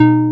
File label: HOLLOW